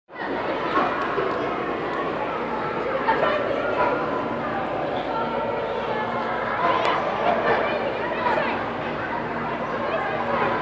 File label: Indoor Swimming